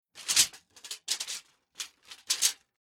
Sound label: Metal Tape Measure Metal Tape Movements Various; Tom Hutchings